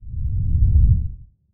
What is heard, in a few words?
epic swishes